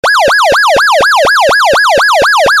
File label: Siren Tone UNITROL YELP